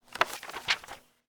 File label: book open; Escape from Tarkov